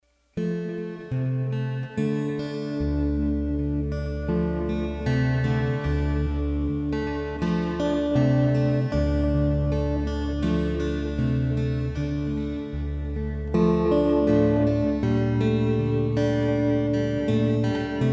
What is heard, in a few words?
Acoustic